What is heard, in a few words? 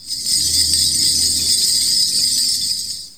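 big small gold coin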